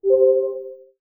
lead request; CosmicRage

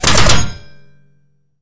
metal

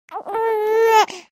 baby sob; CosmicRage

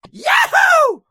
cheer

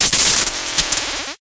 stun armor; combat; enemy

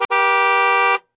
honk, highway

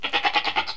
goat; Farming

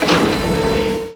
mineral scoop; planetoid

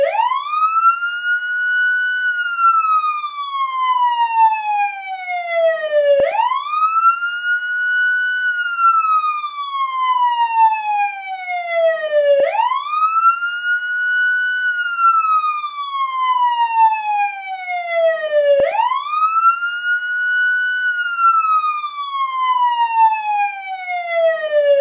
siren in

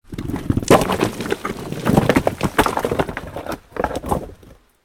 chunk; factory

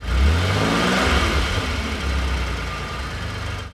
rev out, cars; CosmicRage

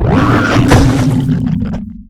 alian die; combat; enemy

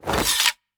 retract; CosmicRage; combat; weapons

